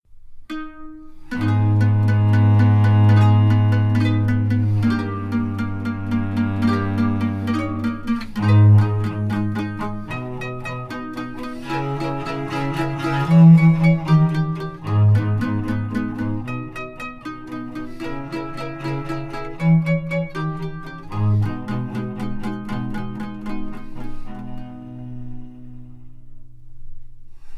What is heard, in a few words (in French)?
SONATA La Majeur Larghetto